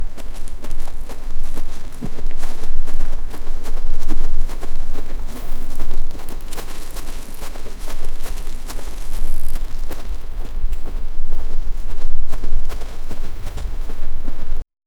group of animals walking

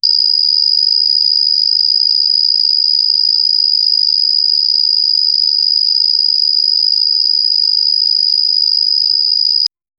cricket sound; insects

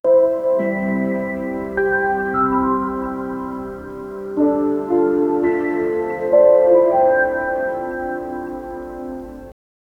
slow ambient lullaby; sleep

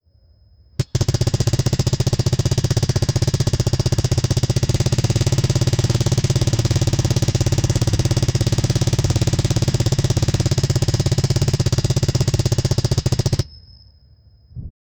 sound of a two stroke, motorcycles

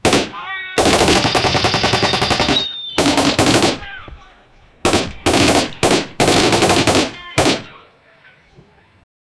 western style cowboy band

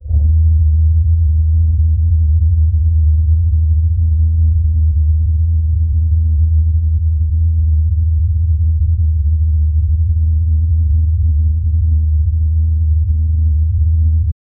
the sound of low mid freq, aircraft

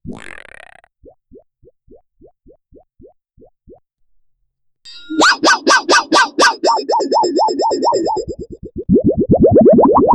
funny sound effe

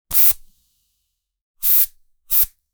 realistic perfume sprayer

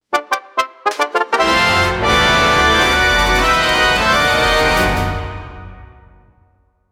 maximum energy jackpot, circus

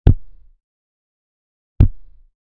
banging

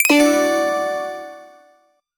pick up item sound, inventory

